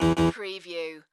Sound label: Casino Slot Machine Harpsi